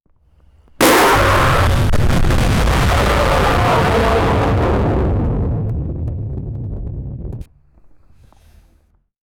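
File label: a giant kaiju sized rubber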